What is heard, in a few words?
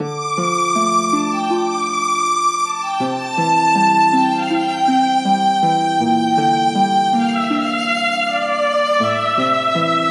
BGM rain